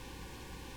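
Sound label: ORGAN Flute noise, Farfisa; Keyboards of The 60's and 70's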